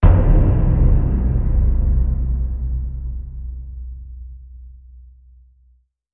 Hit Hammer Down

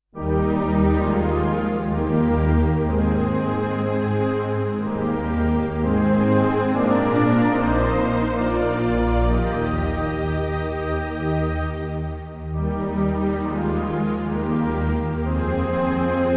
Eternal Love No Piano

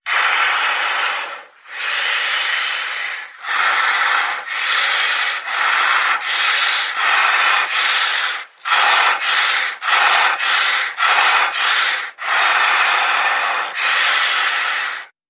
Heavy Villain Breath

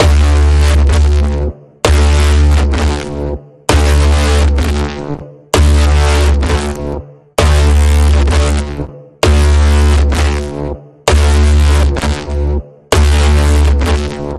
Pulsing Code Generator, D

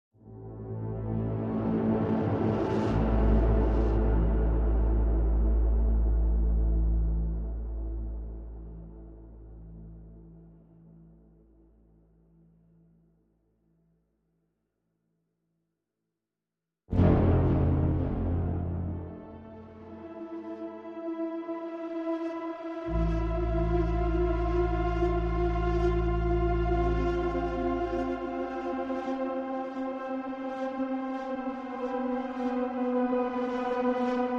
Piano FX Horns FX Tuba FX Only

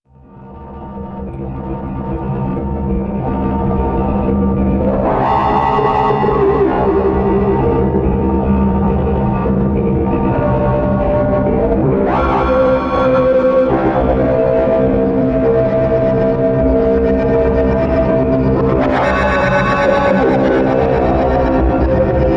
Ambient

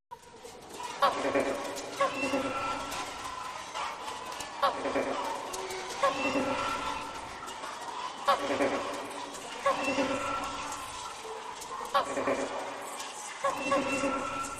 Birds Only

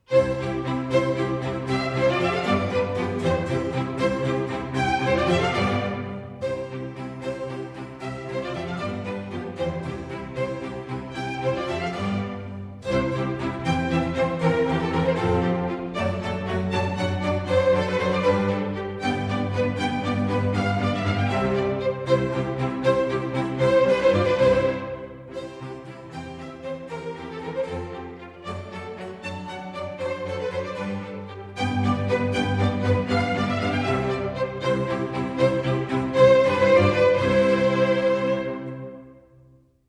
Sinfonia for Strings in C major; RV112; Antonio Vivaldi

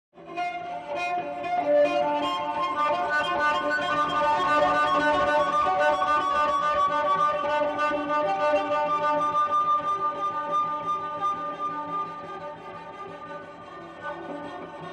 Jittery Cello Only

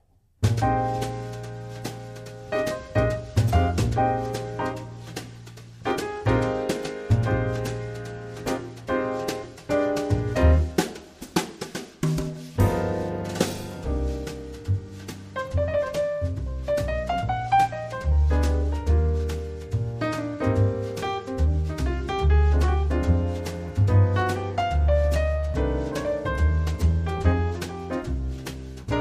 Moonlight Jazz

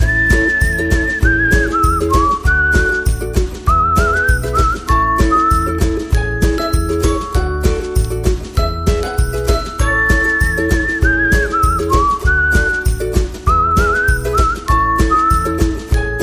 Intro Whistling

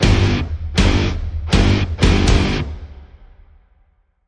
Stab Guitar Phrase (G)

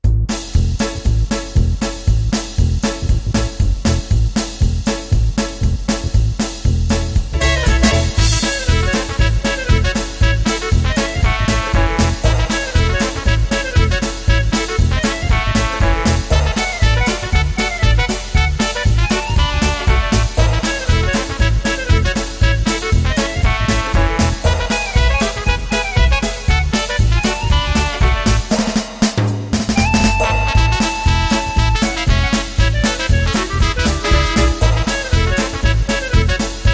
Dixie Trumpet

